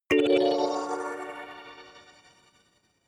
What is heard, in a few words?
notification kalimba magical major